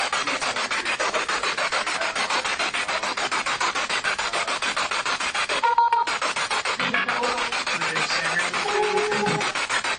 Living Room Spirit Box; private residence freedom road apartment investigation

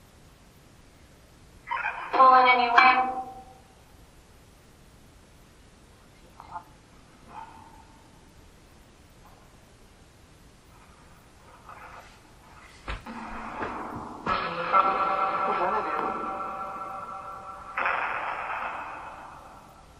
Canopy Room Phasma Box

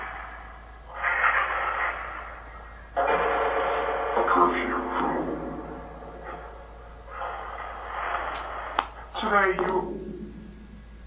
Phasma Box; Canopy Room

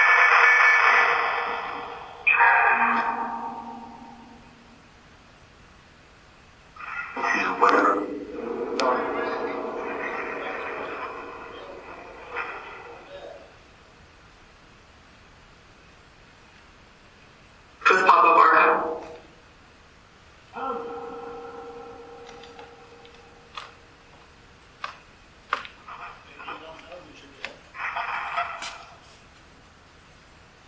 Phasma Box; beaver area high school investigation

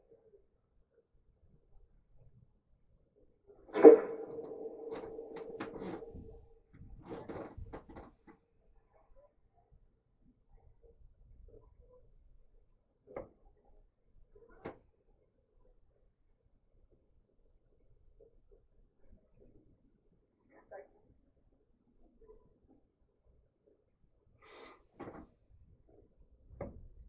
Up Couples Room Spirit Box; wexford general store antique shop return investigation

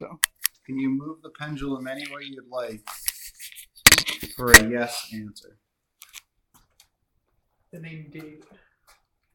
Barber Shop

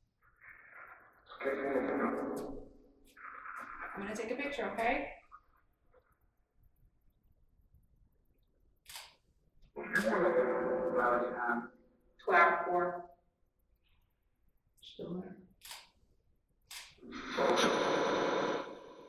Phasma Box; Barbershop Corner

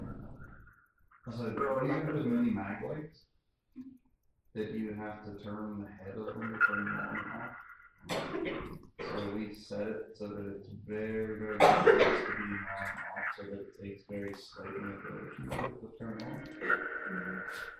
Mean Teacher Room Phasma Box; poasttown elementary school investigation

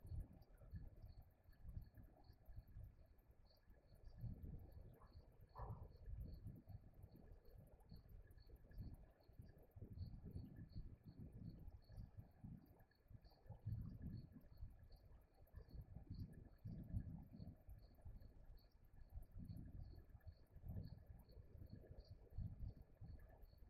Townsend Room Static; Irish-Townsend House – Investigation

